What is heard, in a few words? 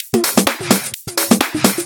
Percussive Loops 128BPM; Vengeance Samples